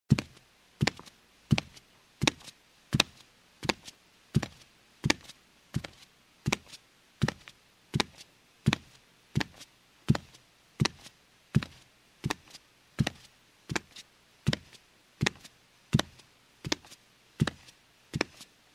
Barefoot steps on a wooden floor

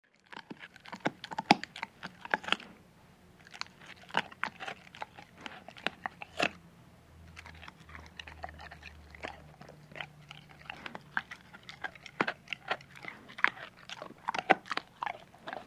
dog eats

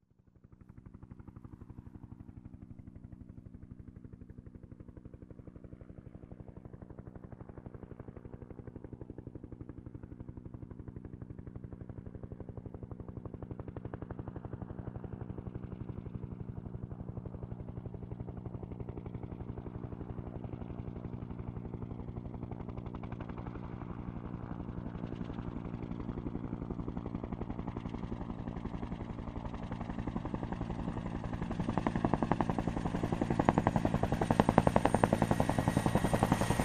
Helicopter approaching and landing

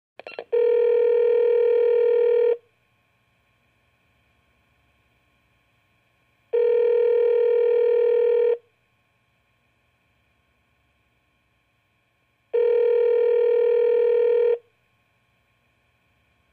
Phone call sound

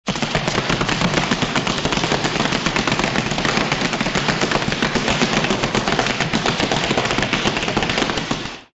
Stomping feet on the floor

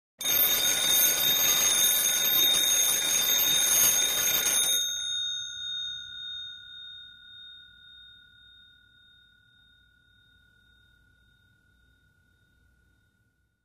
Old phone call

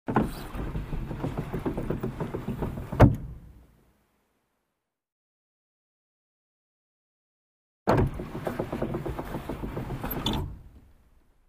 Car glass lowered, raised manually; windows

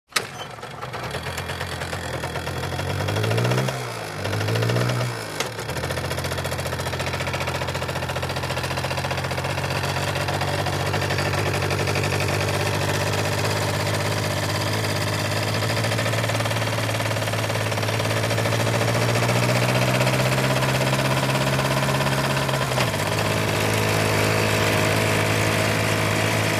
Motorcycle starts, moves, turns off

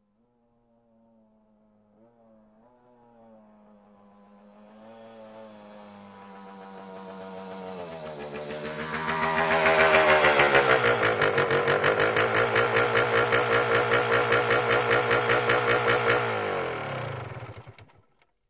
Motorcycle approaching, turning off

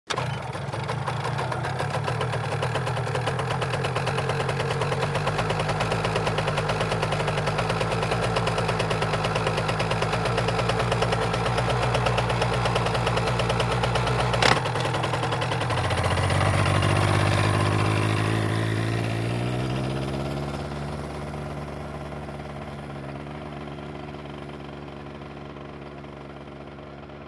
Motorcycle starts, waits, drives away

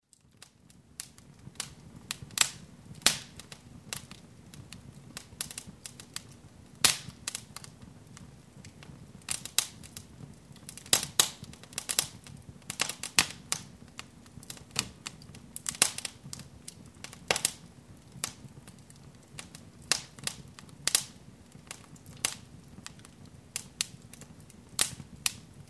Firewood burning in the fireplace

